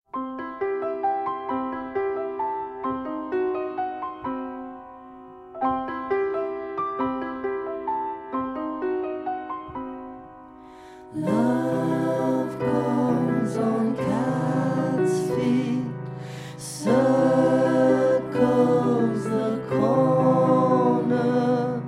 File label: Bird Song